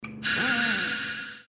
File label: airpump